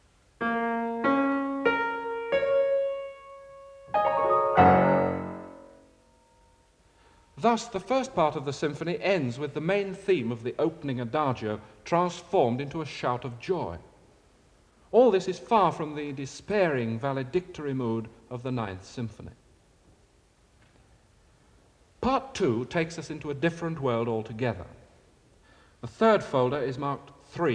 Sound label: Music Example (Piano); Deryck Cooke; Illustrated talk, Symphony No.10